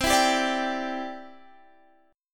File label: C Major Add 9th, C, down, Guitar, Standard; strummed; JGuitar